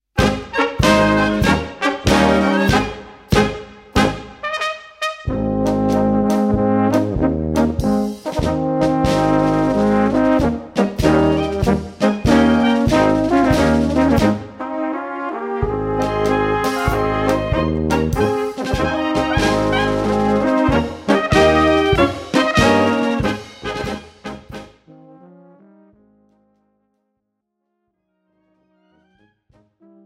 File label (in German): Polka